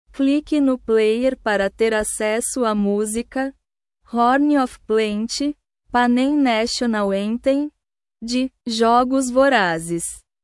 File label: panem national anthem; the hunger games jogos vorazes